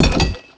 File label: cog in